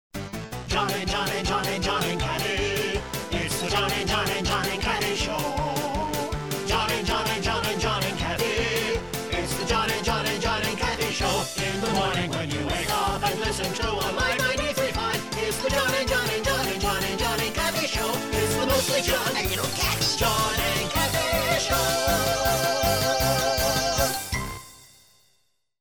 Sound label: Show Jingle